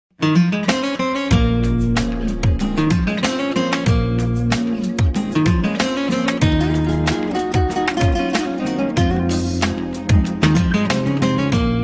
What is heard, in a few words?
Guitar Melody